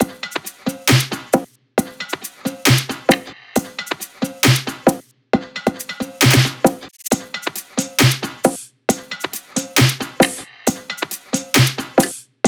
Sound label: loop top 135, Seismic Loops